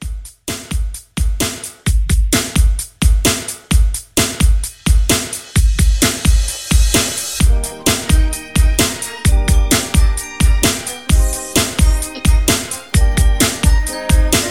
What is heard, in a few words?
Christmas Re-Drum 130